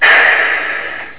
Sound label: AIRBRAKE